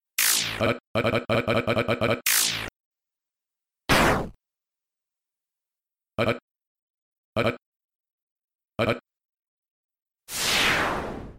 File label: death; freak fortress 2; dell